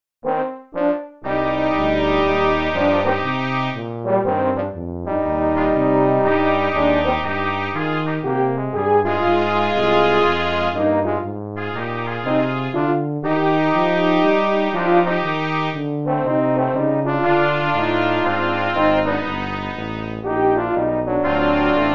opening theme brass quintet; Theme song from the TV series

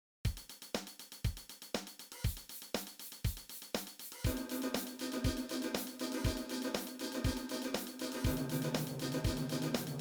Funky Perc

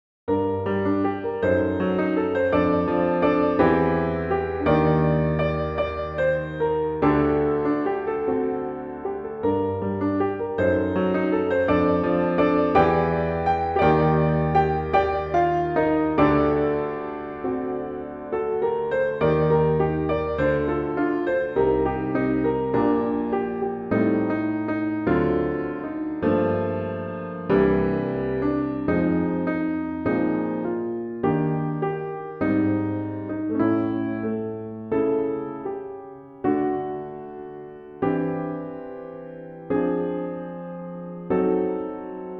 Piano

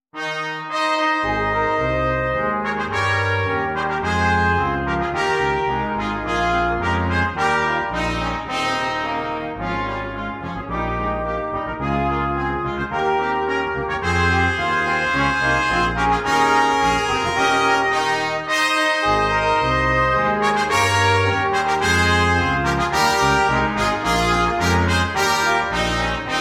Fanfare; NotePerformer

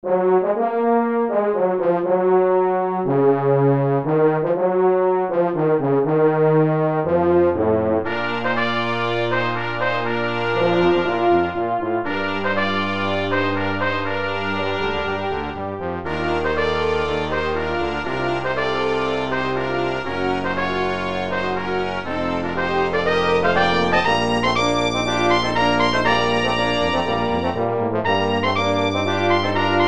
brass Quintet ending

